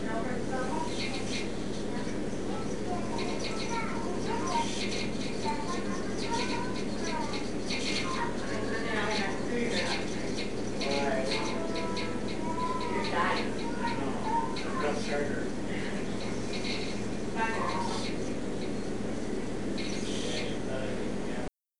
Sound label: Child Singing; White Inn Rm 272